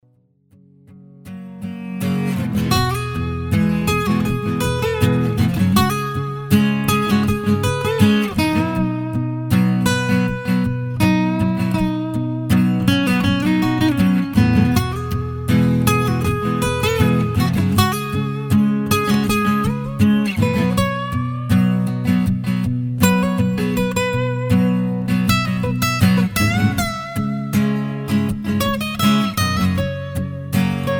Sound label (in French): guitare seche